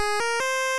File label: Unused Jingle; Tetris DX